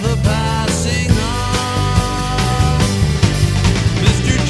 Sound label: music; wu xing; earth